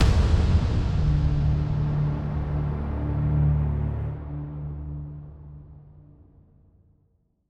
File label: Stingers sting combat